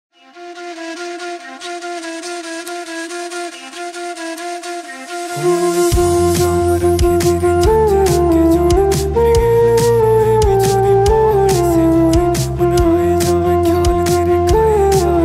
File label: instrumental ringtone